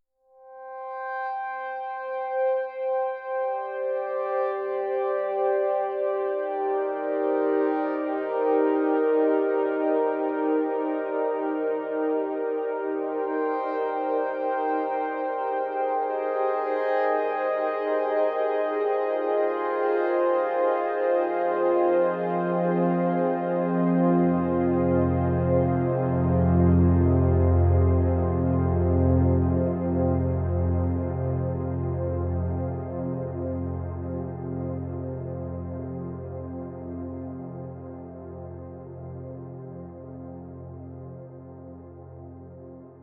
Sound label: shruthi 1 pad